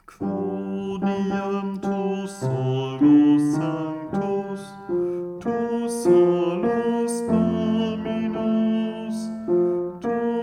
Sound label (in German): Bass